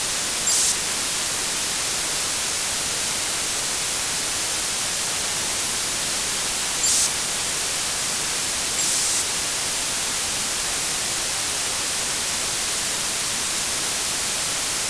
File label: c band bad